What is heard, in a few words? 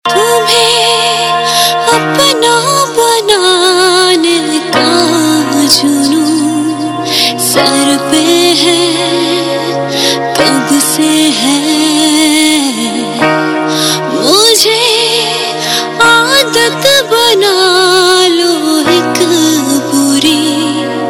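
Mashup Cover Ringtone, Punjabi Pop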